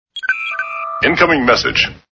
Incoming

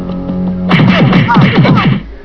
beat

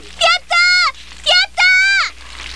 yayay; "Yatta! Yatta!" my favorite exclamation; victory shout

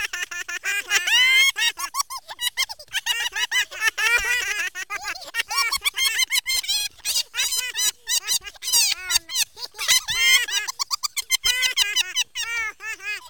little laughing mice

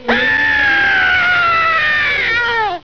scream

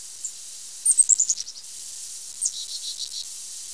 chickadee snarl

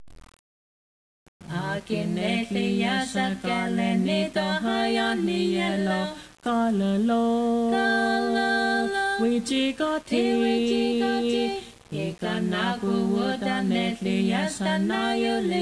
allelujah

